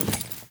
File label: Walk Default, Footsteps; Enemy; Lady Deora